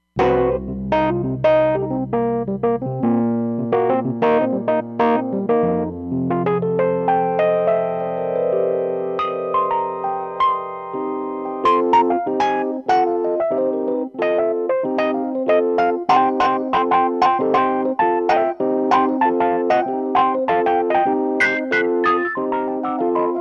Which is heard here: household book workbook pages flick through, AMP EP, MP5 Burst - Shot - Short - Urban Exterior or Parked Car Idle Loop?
AMP EP